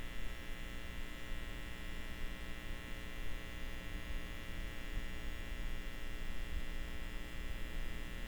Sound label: magnet; Kerbal Space Program; KAS